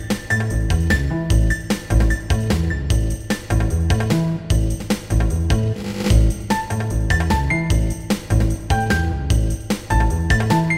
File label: music ringtone